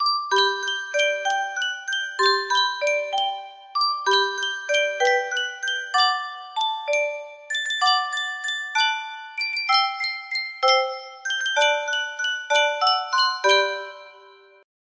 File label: Yunsheng music box